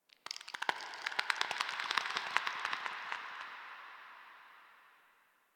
Tendon Stretch Large Distant; Ambience; Misc Outdoor; Warframe; Infested Eris

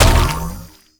Mushroom Bounce Impact; Warframe; Nokko

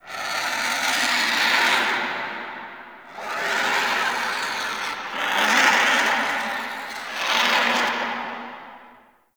Dungeon Island Vultures, Ambience

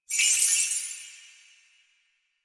Naginata Whoosh Tonal; Weapons; Melee; Warframe; Koumei Naginata

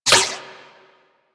Ricochet; Warframe; Cowgirl

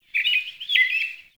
Forest Open Glade Bird Med; Ambience; Day

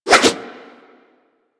Axe Small Spin Swing; Warframe; Weapons

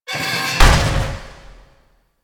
Medium Piston Fall; Warframe; Ambience; Eidolon Plains

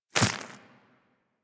Small Walk Default, Infested Footsteps; Warframe

